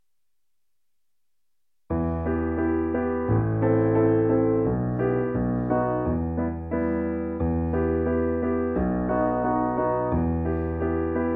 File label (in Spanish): PIANO